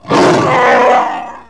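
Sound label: Wookiee female battle; Star Wars Knights Of The Old Republic I; XBOX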